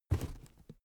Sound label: cardboard box small lift handle; objekte